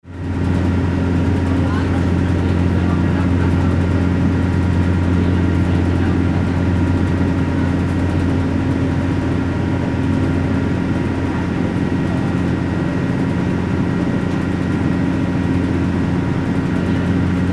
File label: boat barge driving noise